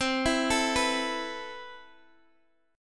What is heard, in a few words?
C Major 7th Add 6th C; Cmaj7add6 Chord {X X 10 9 10 7} arpeggiated